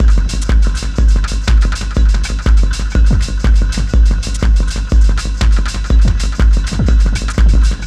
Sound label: drum machine wet, with airspace, hybrid reverb and delay; modeaudio